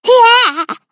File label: Chant Hiyee